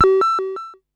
Perc Synth Raw

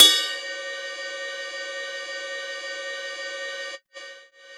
Yamaha CS6, Drums

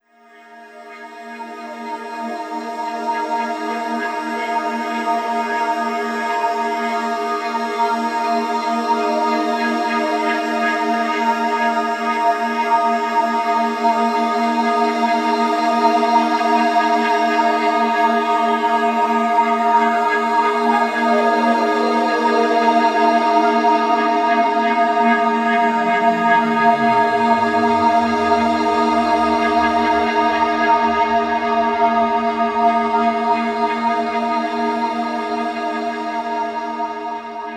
Bright Space, Pads; Sonic Foundry (Sony Creative Software); Slow Silhouette

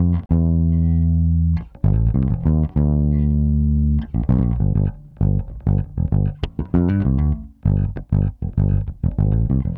Smooth Groove Bass, 70s Analog Funk; Sonic Foundry (Sony Creative Software); What it Is!

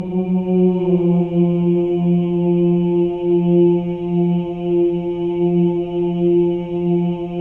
Voices; Spectrasonics; Symphony of Voices; HALF DOWN

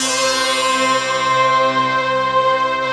rough stack; Optical Media International; Sonic Images Library

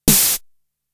FX, Drum Machines; Fricke Schlagzwerg

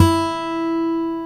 E4, ACSTC.G; AKAI S-Series CD-ROM Sound Library VOL-2

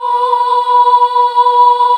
FEMALE B3, CHO AH

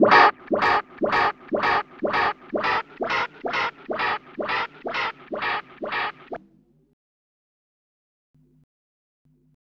Rhodes FX; EZRollers

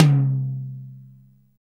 DRY TOM; Drums In Your FACE!

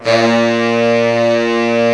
SAX Bb1; Giga Samples Collection; SAX STAX 4

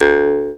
JAW; Big Fish Audio - Didgeridoo and Other Primitive Instruments; JAW SIMPLE

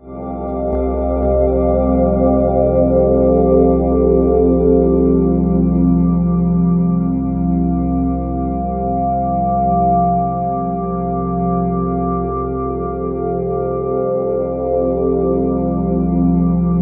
HI ORGAN, Ominous Drones; USB Soundscan vol.48; AKAI; MYSTIC

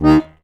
HOHNER, AKKORDEON; Best Service; Alpine Volksmusik

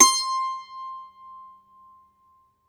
Mandolin, Plucked Strings; E-MU Producer Series Vol. 7 – Old World Instruments

